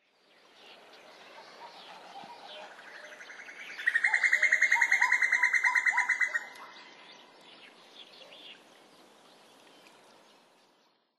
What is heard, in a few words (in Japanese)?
kakou, nikko